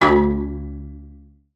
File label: harp unpleasant